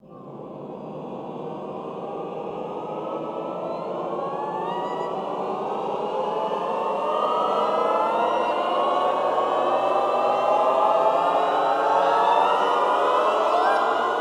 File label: ENDLESS, Classical Choir, ENDLESS GLIS; Best Service; Extended Classical Choir